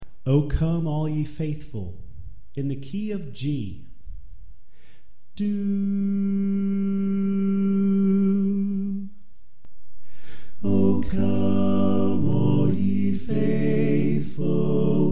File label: Soprano Left